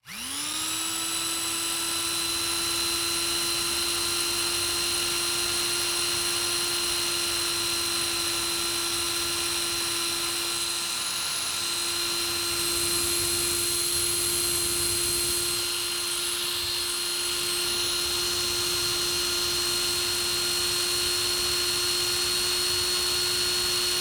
Industry Engine Power Saw

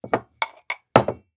Dishes Put Down And Clank Ceramic; household

